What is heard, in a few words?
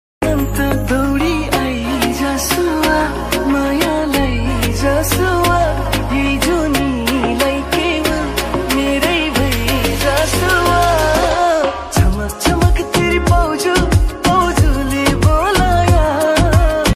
assamese call ringtone, bgm music; assamese viral ringtone